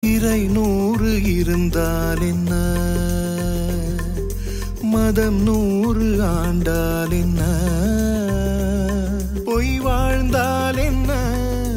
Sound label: Song Tamil; Vanangaan